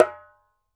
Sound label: ASHIKO, African Drum